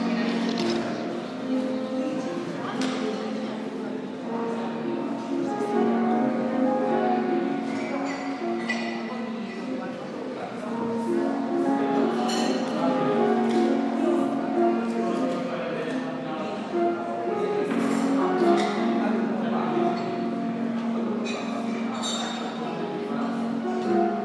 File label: cafe impromptu piano